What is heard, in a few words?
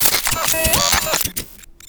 power activate bot small glitchy